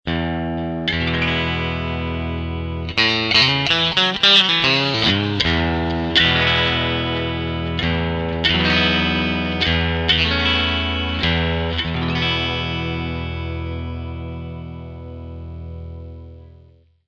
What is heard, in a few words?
bridge clean; alchemist 90; axetec